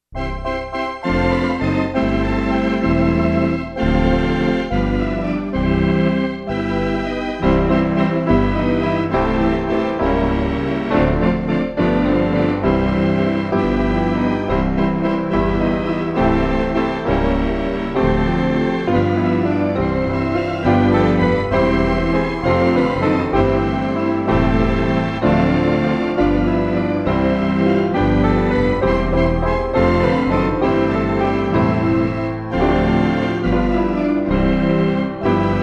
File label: instrumental reference mix